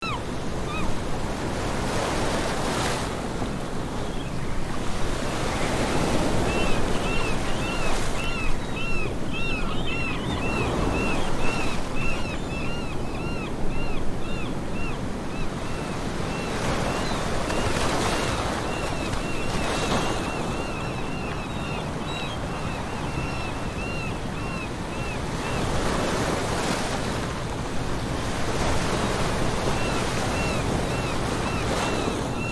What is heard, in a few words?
beach waves loop